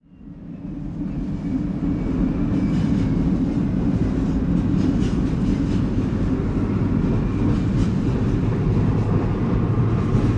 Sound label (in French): Gare De Triage Limoges France